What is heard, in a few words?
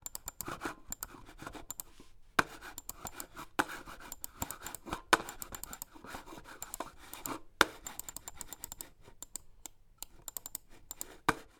office computer mouse wireless miniture clicks and movement on wooden table multiple